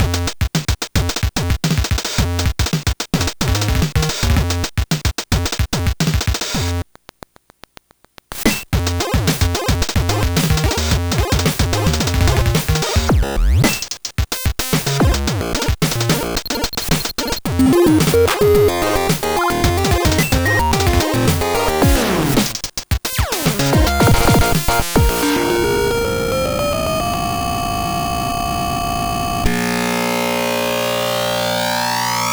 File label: sound test dmg; gbsoundcomparison